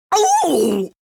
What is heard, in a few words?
boss hit; Dark Cloud 2; King Mardan